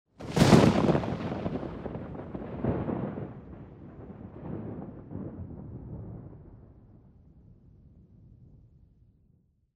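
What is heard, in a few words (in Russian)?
разламывающий звук грома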